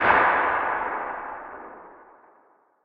echo underground

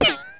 MISS

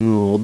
hit; BattleSNDS

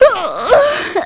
DYING; BattleSNDS

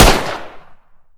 single, 9x21mm; Caliber

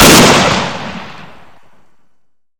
GREN FLSHBANG

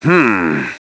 humm; BattleSNDS

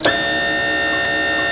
TANK TURRET A

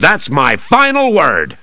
LAUGH; BattleSNDS

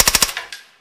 silencer burst

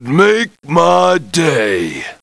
LAUGH; BattleSNDS

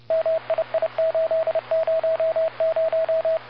scan

